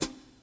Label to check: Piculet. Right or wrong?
Wrong